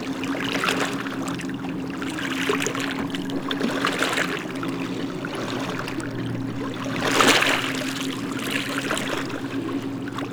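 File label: water loop; Boston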